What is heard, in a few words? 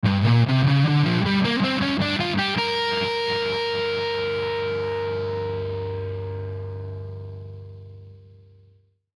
Electric Guitar, Electric Guitar Distorted Blues Scale Ascend; Musical; Blastwave